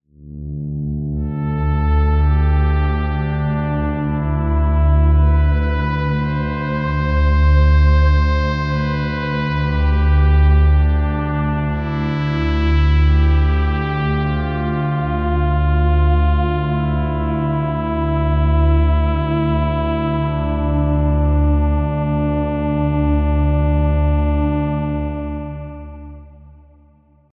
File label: FM Voice